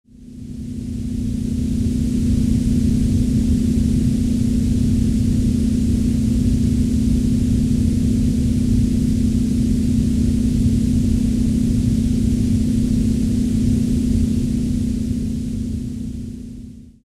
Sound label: Binaural Beats 40Hz Gamma Wave with Noise